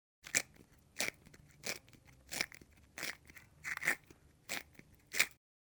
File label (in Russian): zvuk tochilki karandasha